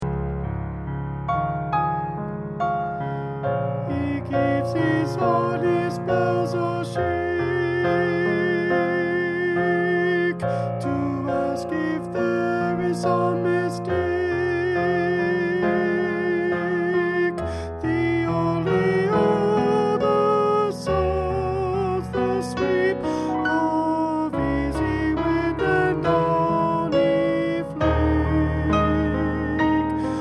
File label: alto